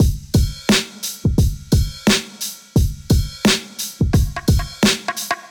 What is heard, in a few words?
MPE Swang Bap Kit 87 bpm, Golden Era Hip-Hop Drums; MIDI Clips; Sound Oracle